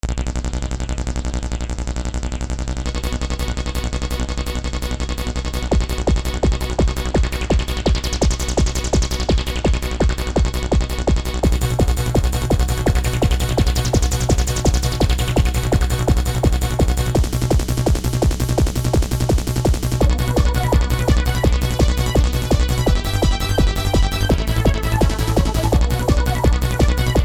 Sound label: Yamaha QS300 Raver